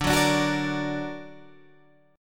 D Augmented D down Guitar Open G

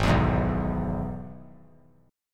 Gsharp Minor Sharp 5th; G#m#5 strummed; JGuitar

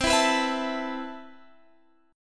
A Suspended 2nd C; A2/C strummed

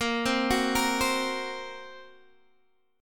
Bb Suspended 2nd Sharp 5th Bb Guitar Open D

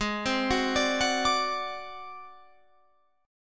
A flat 7th Flat 5th A flat Guitar Open F, chord; Ab7b5 arpeggiated; 20,19,18,21,21,21

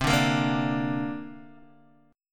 C 7th Suspended 2nd, Guitar, DADGAD

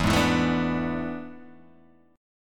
E 11th, Guitar, Open G

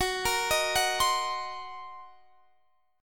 Gb 6th Flat 5th Gb down Guitar DADGAD

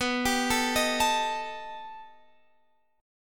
B Augmented 7th B down Guitar Half Step Down

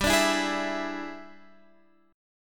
E 7th G, guitar; E7/G; strummed

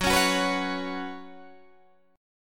Gb Suspended 4th, Guitar, Open D; 16,16,16,17,16,16; down; chord shape

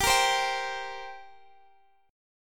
G Minor Double Flat 5th, G, Guitar, Open D

